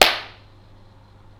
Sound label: impulse; Fourier Transform; MATLAB